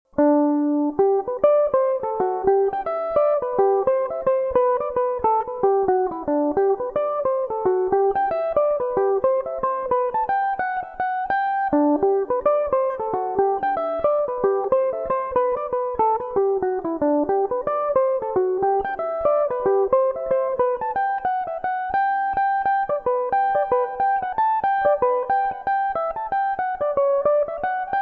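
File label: G major, irish mandolin, tunes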